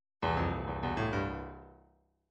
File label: midi fills rock